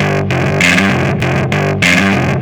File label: Distort bass; Sonic Foundry (Sony Creative Software); Ugly Remnants VOL-2